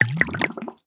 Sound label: brew, brewing stand; Minecraft